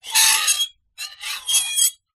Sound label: foley metal scrape squeak small